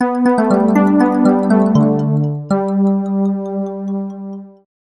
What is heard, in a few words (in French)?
carillon